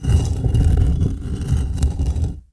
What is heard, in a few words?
stone door